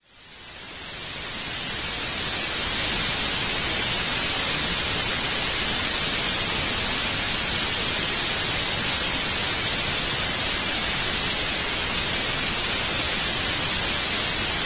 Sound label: White Noise